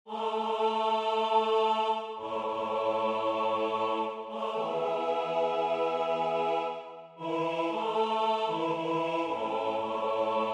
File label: Toast SATB; franssen